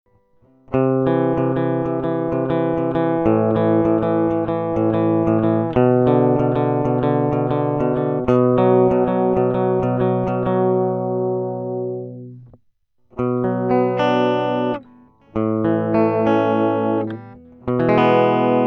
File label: elektro gitara; Arturia MiniFuse 2 - Електро гитара